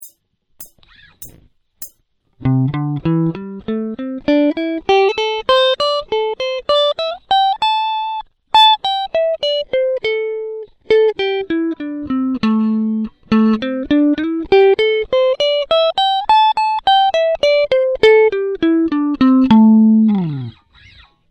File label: blues